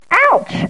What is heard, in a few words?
ouch